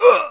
hit, pain; mohaa

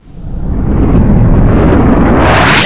golem load; hurrican

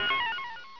collect; hurrican

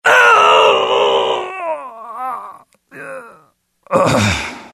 death; mohaa; dialogue

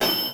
arcade coin; singe; Maddog HD

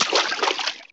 player slosh; xash3d fwgs

